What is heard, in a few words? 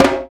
PRC HAND, Africa; Roland LCDP14 Africa VOL-2; Afro Menu 1